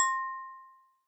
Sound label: synth